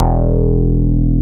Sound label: MINIMOOG; Greytsounds VOL-1